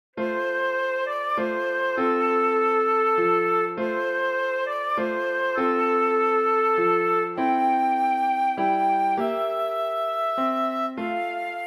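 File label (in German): Stille Nacht heilige Nacht Querflöte, mit Begleitung; deutsches Weihnachtslied für Querflöte und Klavier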